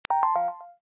Range In; LG GD550 Pure

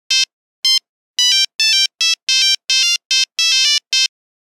Nokia Buzzer Jumping; Windows Phone apps

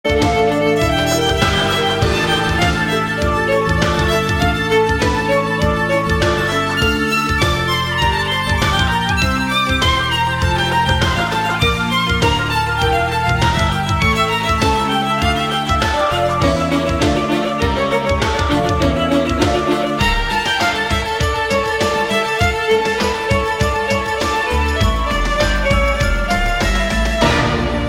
Toccata; LG GM730 Eigen

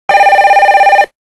Basic bell; Samsung; SCH-i830

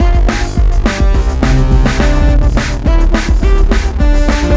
ringtone, electro; Google; NewWave Labs

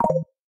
Chaos, notifications; Samsung Galaxy S24

